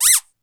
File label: toy